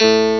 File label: harpscrd; Atari Mega Archive